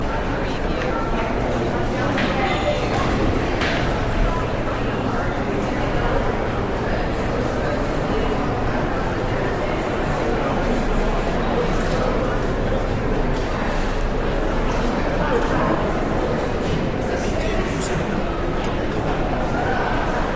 Cafeteria Crowd Walla, Large Eating Hall, Large Busy Crowd